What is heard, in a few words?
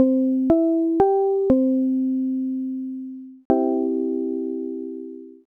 harp